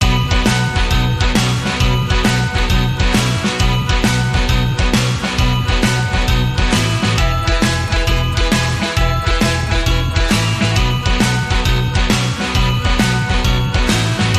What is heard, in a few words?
Rock n Roll Christmas